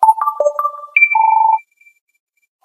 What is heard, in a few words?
Minimal tone